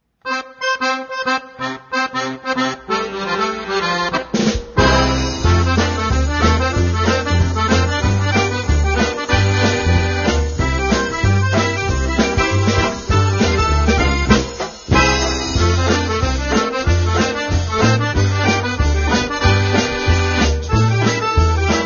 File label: Accordion